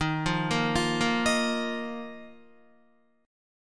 Eb Suspended 2nd, Guitar; arpeggiated; chord; 11,8,8,10,10,10; JGuitar

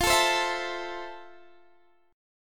E sharp Major 7th E sharp, chord; E#maj7; strummed

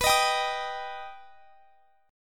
B Augmented B down; strummed

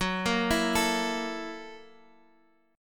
F sharp 7th Sharp 9th F sharp down Guitar Open F; arpeggiated; F#7#9

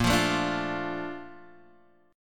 A sharp Major 7th, Guitar Drop D

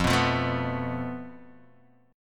F Major Flat 5th Guitar Open D, chord; 3,2,3,3,2,x; down; chord shape

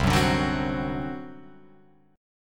C Suspended 2nd Flat 5th C down Guitar Open F; Csus2b5 strummed; Csus2b5 chord

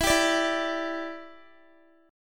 E Minor 7th, down, Guitar; Em7; strummed

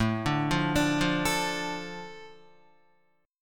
A Suspended 4th Sharp 5th A Guitar, chord; Asus4#5; arpeggiated; 4,5,5,7,5,5; 4F,4A,5C,5G,5C,6E; JGuitar

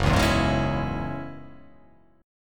F sharp 7th Suspended 2nd, down

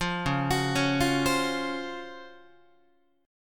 C sharp Major 11th Guitar; C#M11 arpeggiated; JGuitar

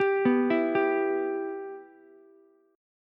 B Suspended 4th Sharp 5th B Ukulele; Bsus4#5 arpeggiated; JGuitar

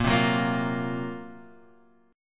F sharp Major 7th Flat 5th A sharp

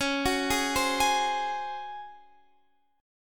C sharp Major 7th Suspended 4th C sharp; C#M7sus4; arpeggiated

